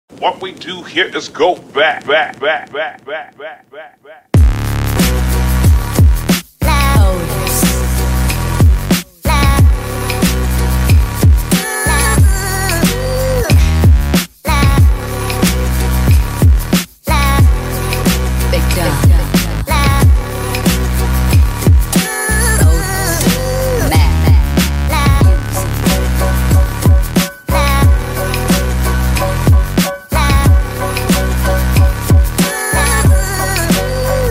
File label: instrumental